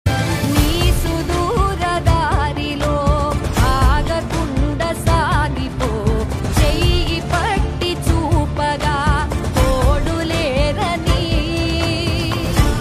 telugu song ringtone emotional ringtone new movie bgm; arm movie